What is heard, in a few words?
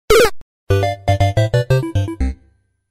player died; Los Acantilados Rojos; smbx38a; The Great SMBX 38A Contest II